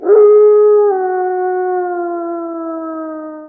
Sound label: wolf howl